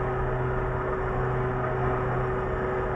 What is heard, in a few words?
loop launch pad